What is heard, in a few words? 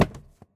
phys cube, physics